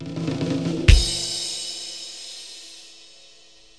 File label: Rimshot